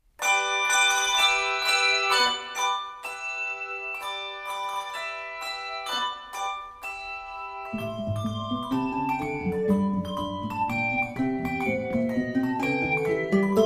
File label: marche